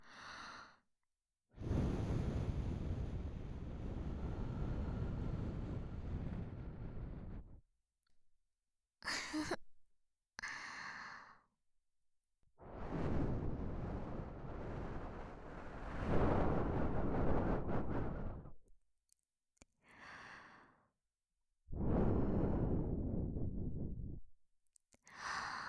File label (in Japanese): 耳舐め 右⇒左